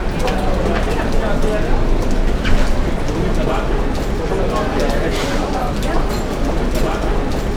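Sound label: atmosphere, 200 BPM, Drum & Bass; Ueberschall; Drum & Bass Resonance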